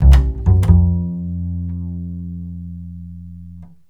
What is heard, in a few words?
BASS, 150 BPM; Ueberschall; Drum & Bass Flash Trackz